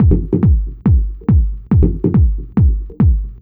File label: Addon Loop, Drum Loops 140bpm, Perc Loops